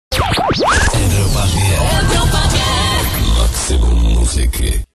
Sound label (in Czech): jingl